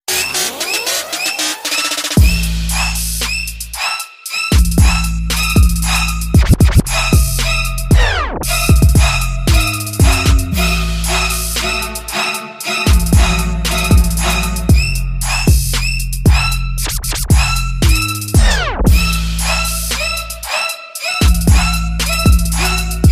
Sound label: trap remix ringtone